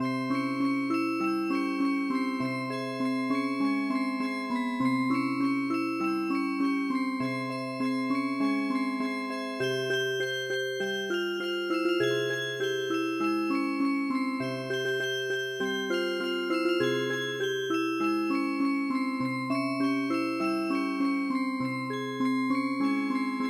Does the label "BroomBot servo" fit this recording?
No